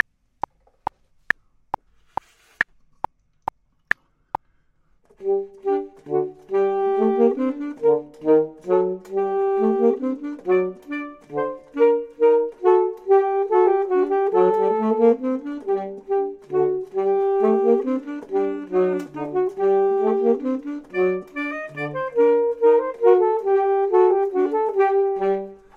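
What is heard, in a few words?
both, Saxophone and Clarinet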